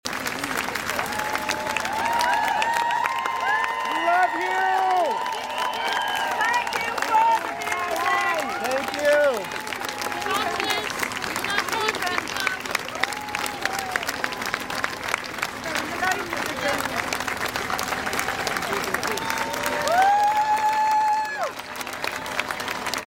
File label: funeral crowd cheers; SINEAD OCONNOR FUNERAL